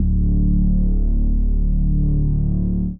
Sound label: Reese D; Instrument Oneshots; 99Sounds; Monosounds